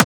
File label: Transient; FLPRO